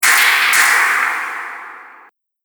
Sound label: Dark Horror Hits; Miscellaneous Impact Sound Effects; Impact / Explosion Sound Effect